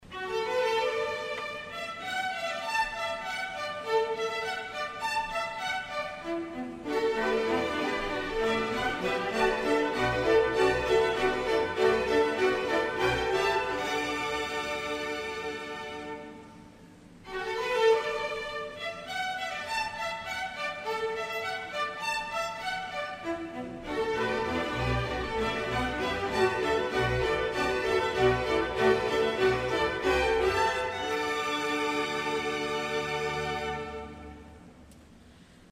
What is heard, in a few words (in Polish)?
hejnal sopotu